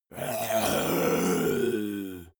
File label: monster growl unmotivated; kreaturen